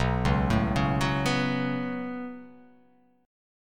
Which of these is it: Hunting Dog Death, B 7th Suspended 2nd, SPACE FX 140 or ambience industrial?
B 7th Suspended 2nd